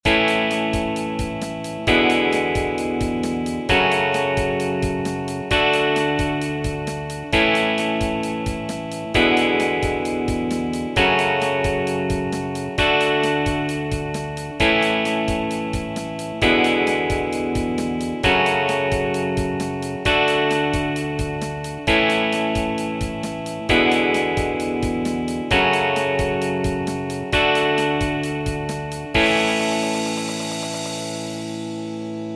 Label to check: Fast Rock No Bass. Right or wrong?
Right